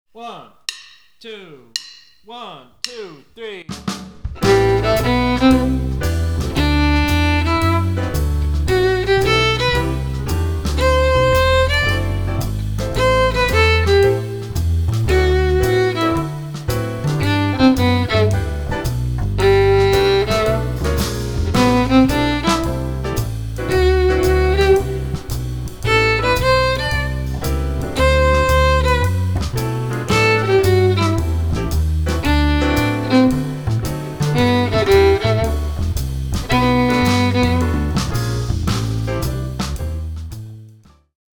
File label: Play-Along G Major Scale With Two Different Rhythms